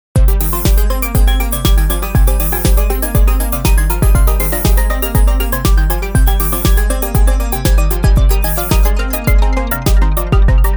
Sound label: tempo sweeps; MIDIbox SEQ V4